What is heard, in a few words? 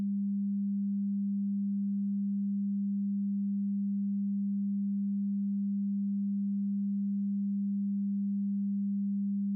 record input